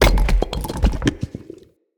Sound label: Warrior Statue Bust Drop